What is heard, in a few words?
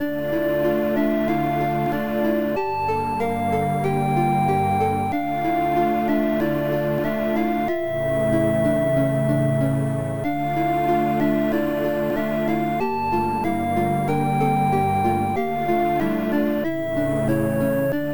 tune; Castles II Siege Conquest; cd32 amiga gamerip 1993